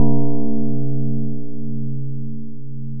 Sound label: bell